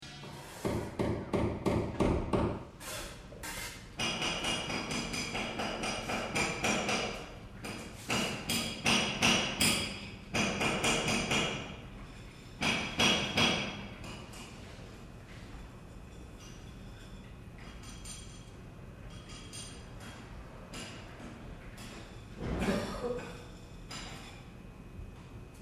Work Being Done Hammering Plastering Scraping Inside Building Or Apartment; Light workshop ambience or industry ambience.; Construction Sound Effects